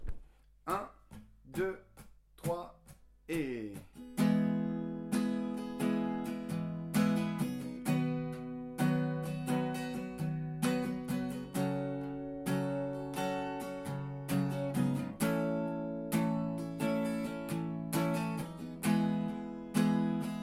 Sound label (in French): casser la voile